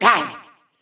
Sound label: pain; Shinobi